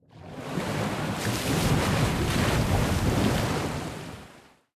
submarines engines; World Of Warships

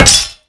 knife metal; Call of Duty Modern Warfare; physics

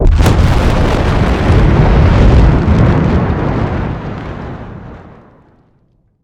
damage vehicle; World Of Warships

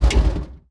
flaps end; War Thunder

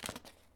goggles pickup; Escape from Tarkov

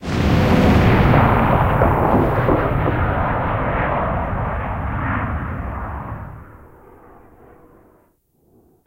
rocket tail

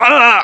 pain, voiceovers; localized english

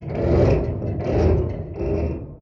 damage vehicle; World Of Warships

